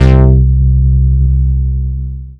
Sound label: Single Electric Basses, Dance Floor Bass